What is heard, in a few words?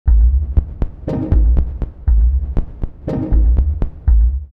112, drumloops, DrumMachines; X-Static Goldmine